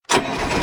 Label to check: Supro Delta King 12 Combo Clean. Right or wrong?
Wrong